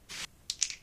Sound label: die Grab, casino